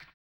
HAMBONE, Vocal Blues Soul; Spectrasonics; Planet

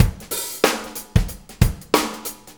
Shuffle Loop, Full Kit Loops

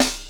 KIT, Dance Mega Drums, HIP

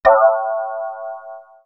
Swirls Klang, pads; X-Static Goldmine

